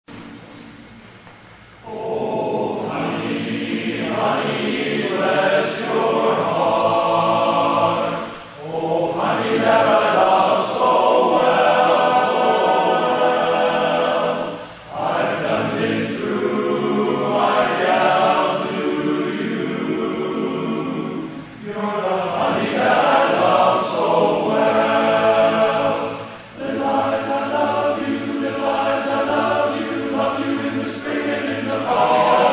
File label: Medley; Red Deer Retreat